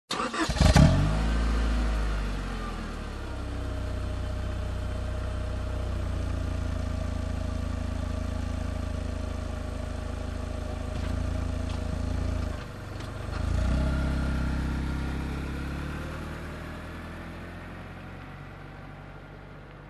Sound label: Car engine ignition and drive away